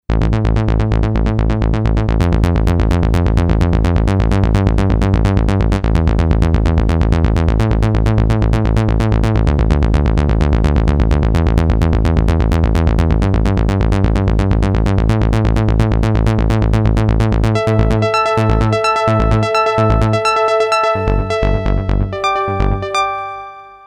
korg m1r synth

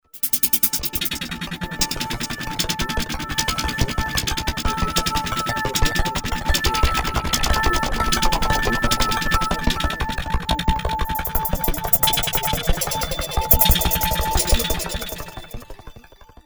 fx future drum, roland jd800; synth

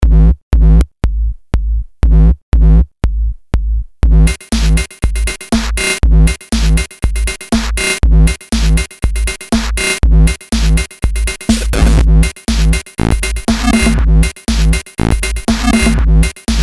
mpc60; eko ritmo20; OTO

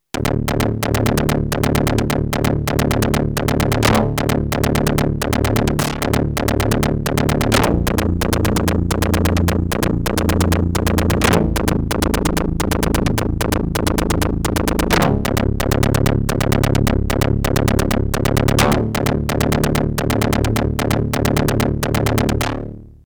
yamaha tx81z

